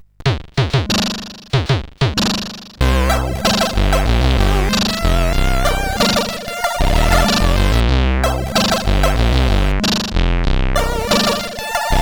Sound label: Twilight, sid CSG8580R5